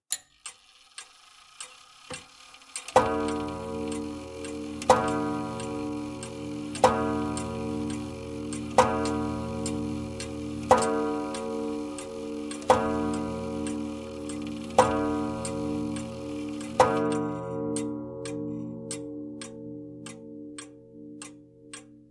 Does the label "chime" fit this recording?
Yes